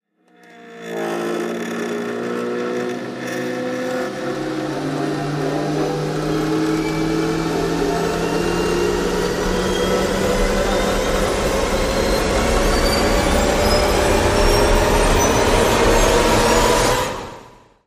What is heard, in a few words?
Riser Acoustic, Cinematic Risers